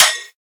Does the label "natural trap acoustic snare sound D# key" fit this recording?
Yes